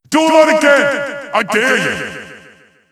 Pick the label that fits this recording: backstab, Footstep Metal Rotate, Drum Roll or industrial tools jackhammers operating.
backstab